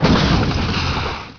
EXPLO

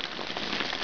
FIRE

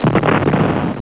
BOOM; YAGG

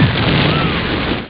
explosion

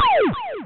Phaser

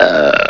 Barney's Burp

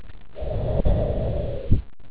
air; SHARKS!AGA

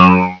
BOING; GREAT ACTION; CIRCUS

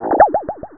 Pulse

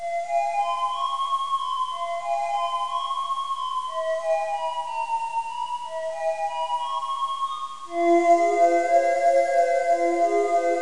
Title music

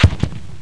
BOOM

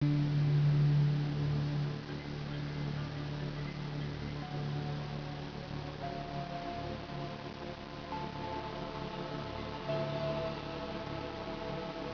CARBOARD

